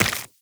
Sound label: Run Flesh; Footsteps; Warframe; Tenno